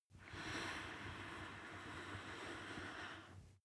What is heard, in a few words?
breath female inhale